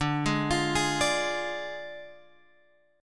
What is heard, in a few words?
D Diminished D, Guitar, Open G